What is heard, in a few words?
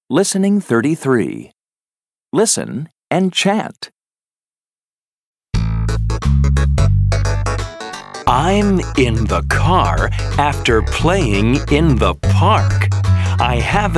Chant